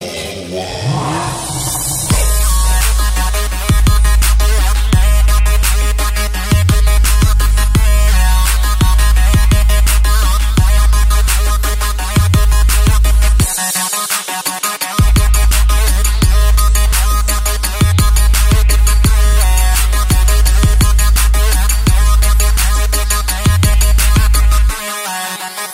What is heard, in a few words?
Bass